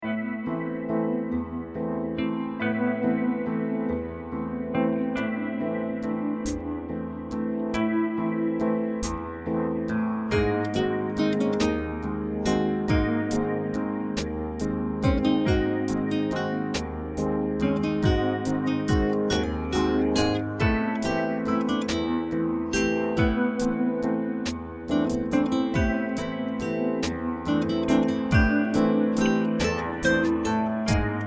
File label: Offbeat Piano & Guitar; Uruguay; Travel Channel - No Reservations Uruguay